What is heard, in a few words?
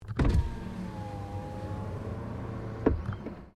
Car Toyota Corolla window open; Car Toyota Corolla 20 09; 2009 Toyota Corolla hatchback; Cars (Sound Effects:Vehicles)